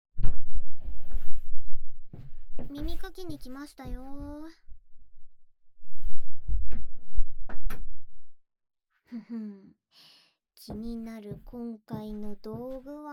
本格！耳かきセット, ASMR

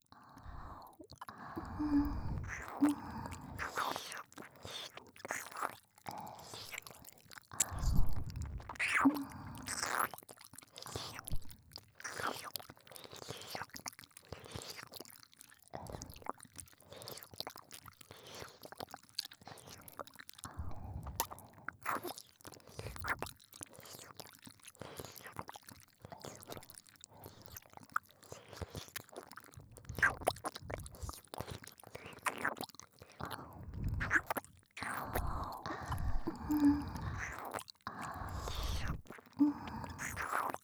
ひたすら耳舐めループ

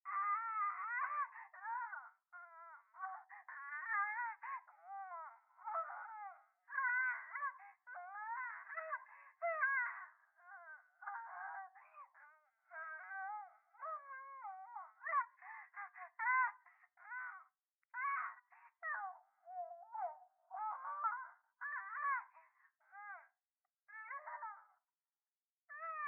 電話 シャブ喘ぎ 雨上がり

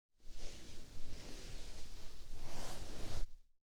衣擦れ 右→正面; 効果音

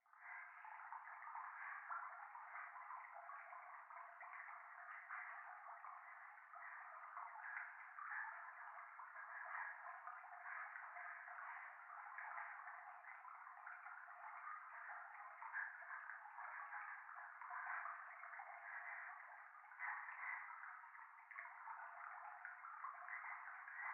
教会 右耳快感吐息 川のせせらぎ

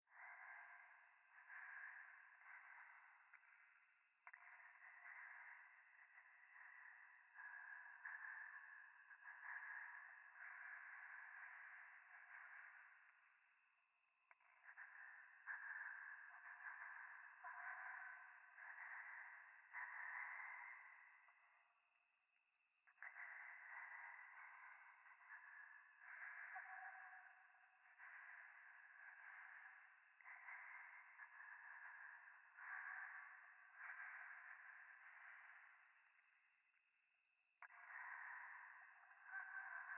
ゴースト 右耳快感吐息 山奥夜

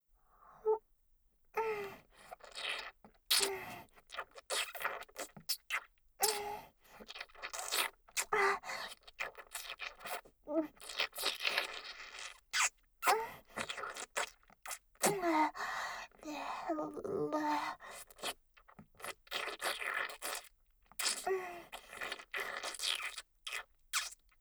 朝のご奉仕モーニングフェラループトラック, ASMR

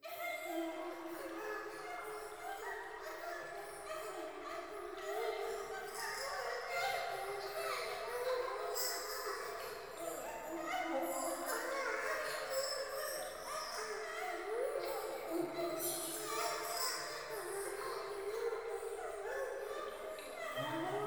風呂 喘ぎ 川のせせらぎ

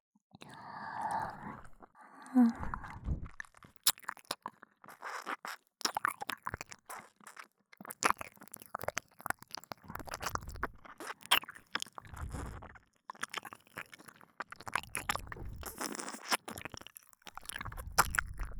右耳舐め, ASMR

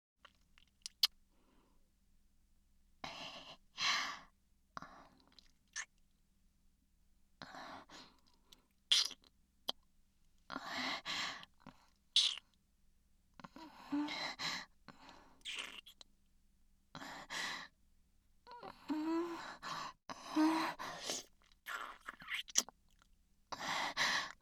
キス; SEなし

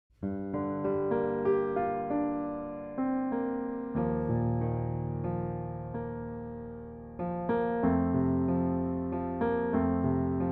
おやすみピアノ

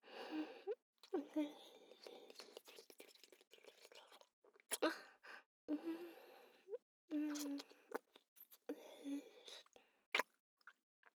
乳首舐め, ASMR; アドリブ集

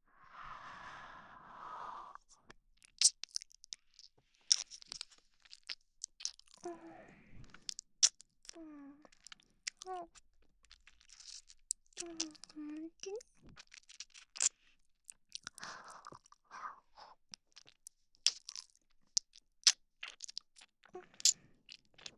耳舐め, ASMR; SEなし

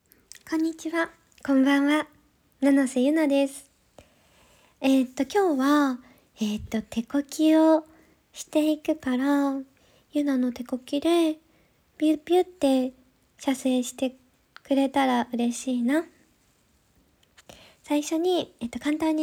手コキ音

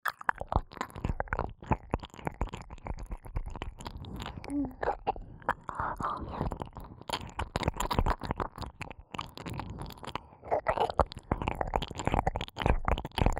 ぐぽぐぽ左耳舐め; 耳元ループ; おまけループ（オナサポ）

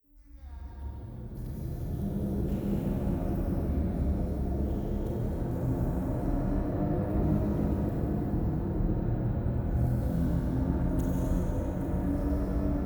ゴースト フェラチオ 車の中

